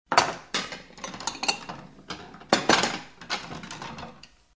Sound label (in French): vaisselle